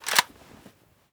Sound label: ak74u bolt back; Rust